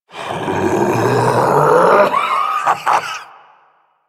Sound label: Ability Lift Laugh Lich; Warframe; Kuva Lich